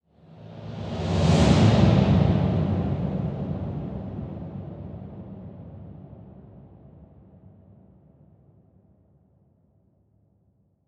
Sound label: Low Swoosh